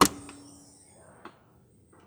Lamborghini Door Open, Automobiles

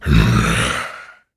zombie aware; DeadFright

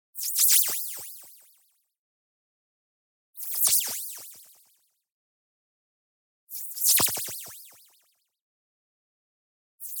WOBBLE Knife Scrape Shift; boom library; Anime Essentials; DSGNMisc

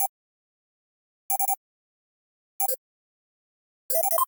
Generic Click Digital

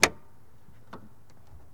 99 Mustang Hood Open, Automobiles

